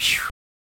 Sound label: Chants